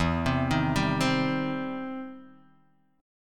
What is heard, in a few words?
E Minor Major 7th Sharp 5th, E, down, Guitar, DADGAD